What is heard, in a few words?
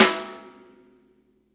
Snare Hard; ACID MUSIC; Drums; One Shots; Noble & Cooley